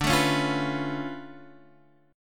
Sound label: D Minor Major 13th, down, Guitar, Drop D